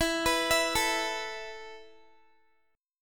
E Suspended 4th E, down, Guitar, 4E,4A,5D,5G,5C,6F; Esus4; arpeggiated